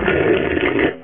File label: DRINKING